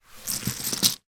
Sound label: game plastic houses and hotels scoop or pick up from carpet floor; monopoly